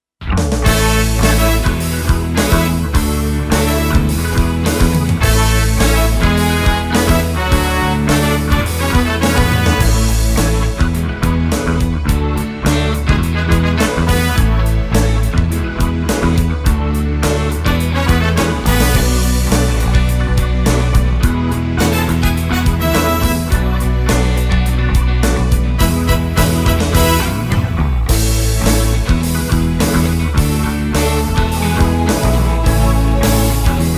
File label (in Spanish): audio oficial melodia